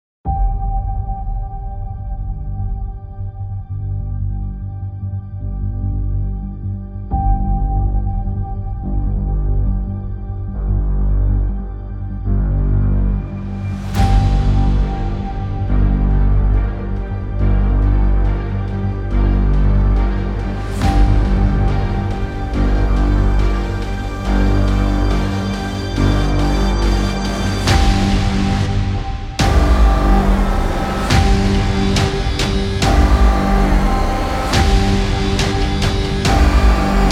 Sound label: Action Game Opener, intense trailer themes